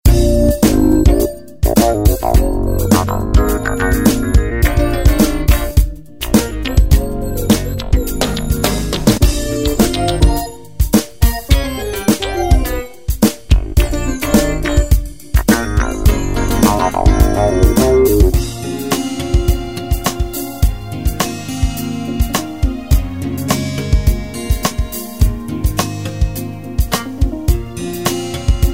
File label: SOUL FUNK 105, drum loops